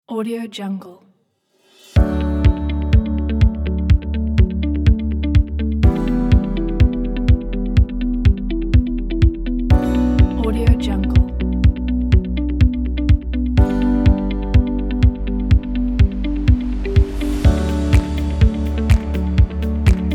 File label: corporate background success piano